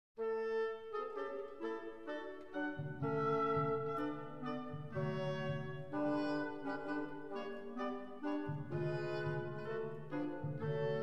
Hungarian Dance in D major, Symphony Orchestra; Brahms Hungarian Dances; Istvan Bogar; Budapest Symphony Orchestra

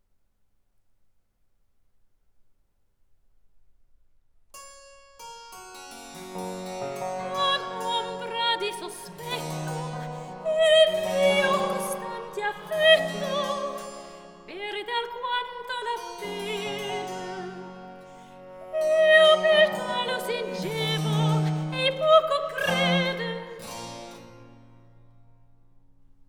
Cantata, Recitativo; RV 678; All'ombra di sospetto; Vivaldi - Bellezza Crudel

